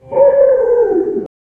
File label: celestial fox grunt